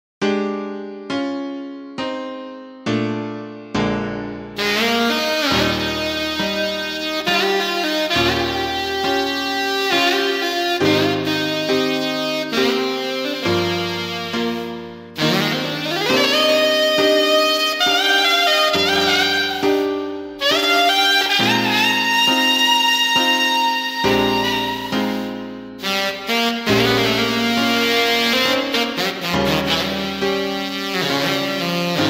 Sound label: Brt Tnr